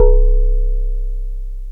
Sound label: DX PIANO